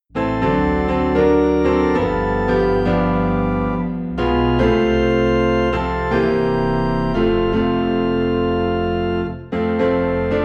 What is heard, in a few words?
Piano and Organ Low in F